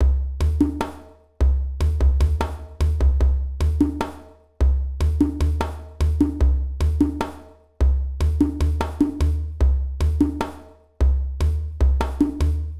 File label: Djembes